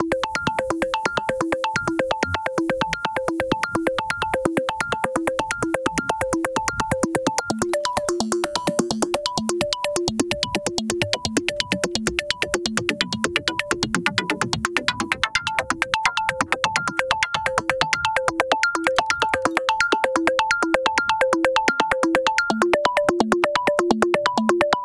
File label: 128 Fmaj7 Bmin7b5 synaptic pluck; daily samples